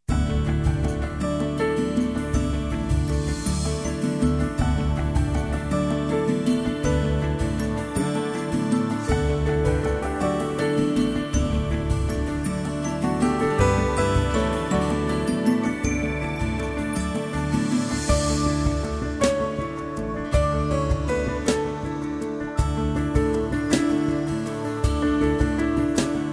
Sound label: holiday